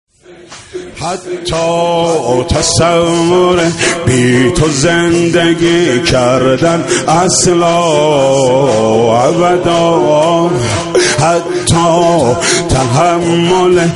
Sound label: NAWHA ARABIC